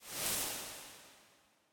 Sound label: fire; smite; hades